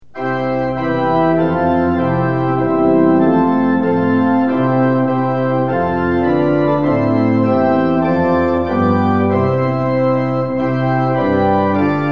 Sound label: tune; hymns; lillenas